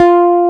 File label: E BASS F3, Bass Guitar, STACK BASS; AKAI S6000 CD-ROM - Volume 1